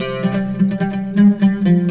SITAR ETHNO 125; eJay; Guitar

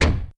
Latch Thud

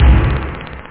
DZING, SNARE; da capo vol1; drums

